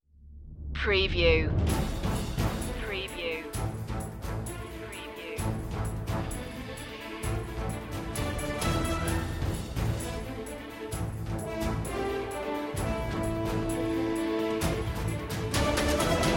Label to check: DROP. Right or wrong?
Wrong